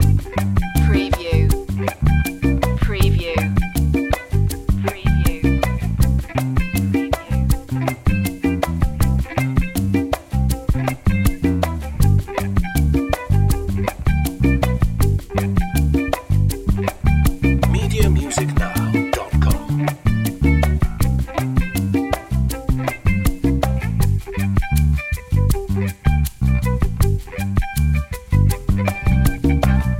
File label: bachata loop